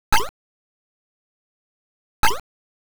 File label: Enemy Crushed; Oretachi Gesen Zoku BurgerTime